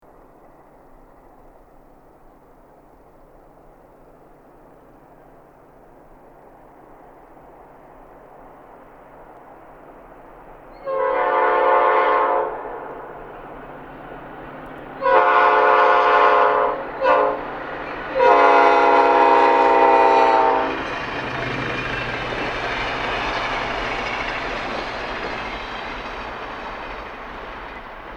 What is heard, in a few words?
horns; p5a